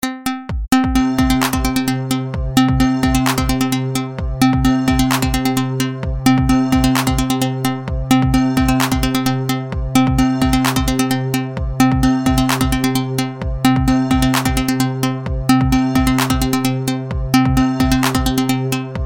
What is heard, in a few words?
String